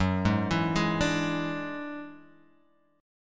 F sharp 7th Suspended 2nd Sharp 5th, Guitar, Open G